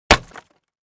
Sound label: Crate Palette Type 1 Hit with Sledge Hammer